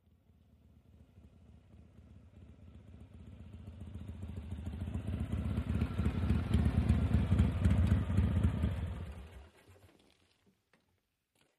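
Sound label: Motorcycle Harley By Very; harley-davidson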